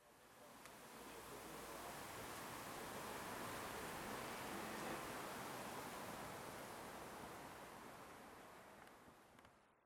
Wind; silent hill downpour; weather fx

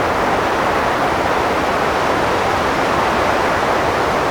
Wind Normal Loop; weather fx; Silent Hill Downpour

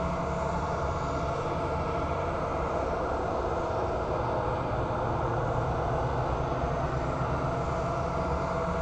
Monastery Threat Loop; horror and atmosphere fx; Silent Hill Downpour

